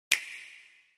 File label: nintendo switch click